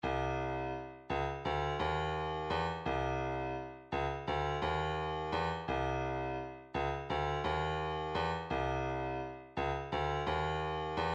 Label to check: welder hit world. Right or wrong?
Wrong